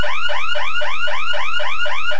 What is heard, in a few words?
warble